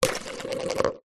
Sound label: sport golf crazy ball drop into hole spin fast